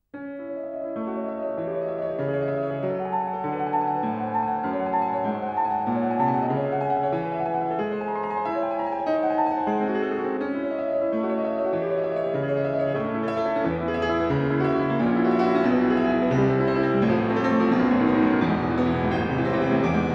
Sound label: Symphonic Etudes, Piano; Schumann; Op. 13 - Var. 3 (Aus Dem Nachlass)